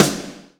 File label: Snares & Claps; Vengeance Samples